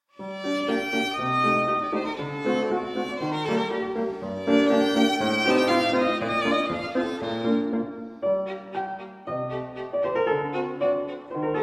Violin Sonata No. 3 in G Minor, IV. Allegro Moderato